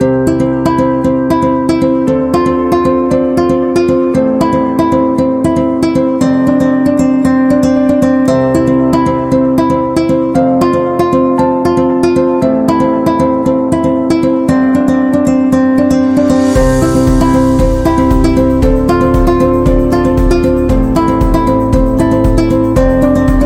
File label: Motivational Acoustic Guitar